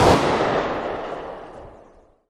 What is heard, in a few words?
famas distant, weapons; Counter-Strike Global Offensive